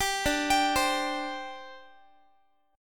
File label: D 7th Suspended 4th, down, Guitar; D7sus4; arpeggiated; 22,22,22,22,x,x